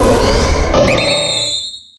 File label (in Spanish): Grito de Mega Gallade